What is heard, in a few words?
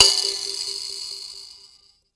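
VIB KALIMBA G 4; Heart of Africa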